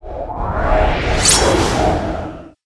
Sneaker; Fly For Insanity

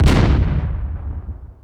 Backstab; Pc Skill; FlyForInsanity

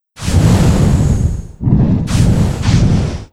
Skill fire magic; FlyForInsanity

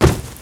Equip Cloth; FlyForInsanity

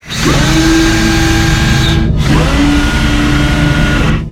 Drill; FlyForInsanity

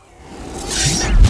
Piercing Arrow; Pc Skill; FlyForInsanity

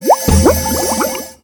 Skill Water ball; FlyForInsanity

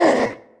attack; Fly For Insanity

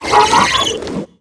Dmg; FlyForInsanity

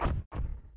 move; Fly For Insanity

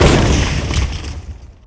Hit; Hit Of Penya; Fly For Insanity

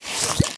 liquorice swirl; Candy Crush Friends

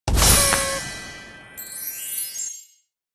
candy surprise placement; Candy Crush Friends

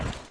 death; FlyForInsanity

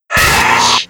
Wheel dmg; Nutty; Fly For Insanity

